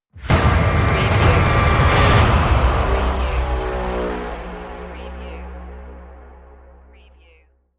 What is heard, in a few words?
industrial impact stinger